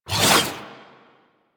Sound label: Warfan Whoosh; Warframe; Tenno; Broken Frame